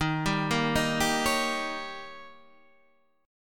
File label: Eb 7th; arpeggiated; 11,10,8,8,8,9